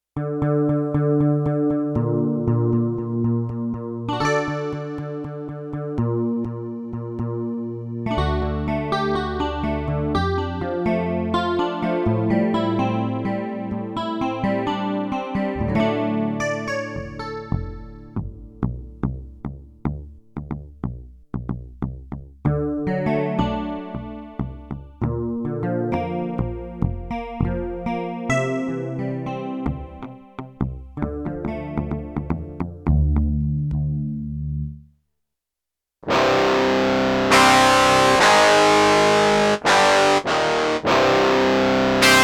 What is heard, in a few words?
Band One, Yamaha TX16W Rock Performance